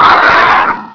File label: zombie pain; XDM